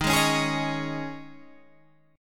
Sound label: D sharp 7th Suspended 4th, Guitar, Standard; D#7sus4 strummed; JGuitar